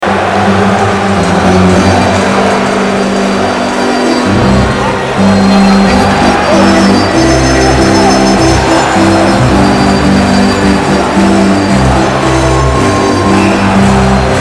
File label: ORGAN ta ta dada tututida tada; OLYMPIC